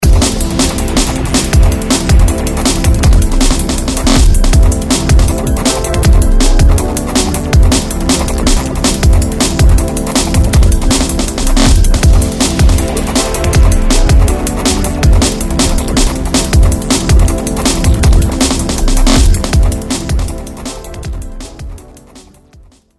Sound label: dnb